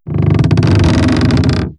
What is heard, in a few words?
Wood Creak